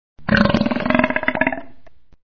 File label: burp